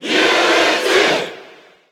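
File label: Cheer Japanese; Mewtwo; SSB4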